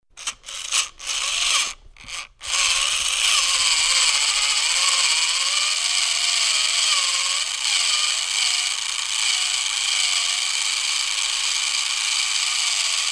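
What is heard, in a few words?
Radio Controlled Electric Car Running, close up onboard; rc car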